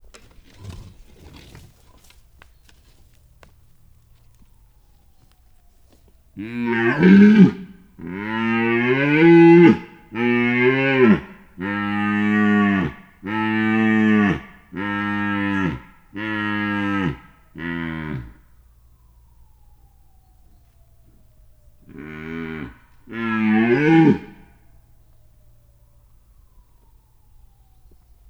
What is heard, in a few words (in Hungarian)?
gimszarvas közelről